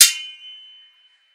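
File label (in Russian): mech odinochnyi zvonkii dolgii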